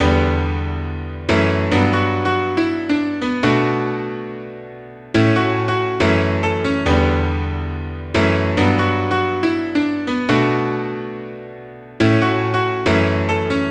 Future Bass Piano Loop 140 BPM B Min; Instruments; Piano Loops

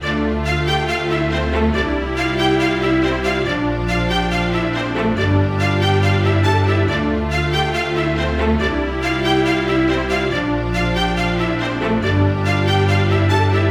String Loop 140 BPM D Min; String Loops; Future Bass; Instruments; Scarlet; Cymatics; Future Bass Starter Pack

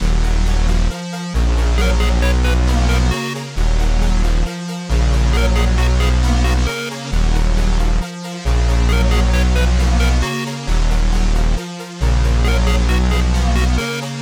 135 BPM D# Min, Melody; Serotonin; Cymatics; 2022 Melody USB Expansion

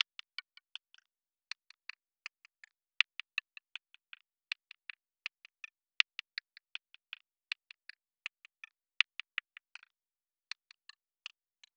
Percussion Loop, 80 BPM; Percussion Loops

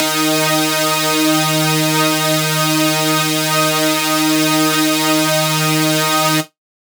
Lead Shot D#, Dubstep; One Shots; Ghosthack; Dubstep Sound Arsenal

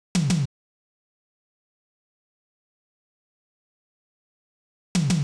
Gated Drum Loop 100 BPM Tom; Analog; Cymatics - ANALOG EVOLUTION Beta

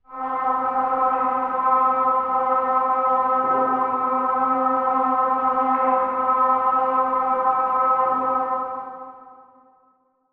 PAD (C); One Shots; Lighthouse; Cymatics; Mystery Pack Vol 4

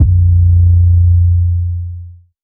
808 A#; Cymatics; Oracle Sample Pack; 808s

